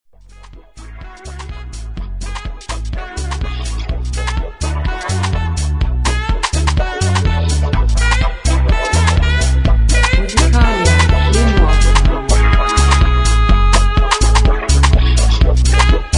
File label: funky wah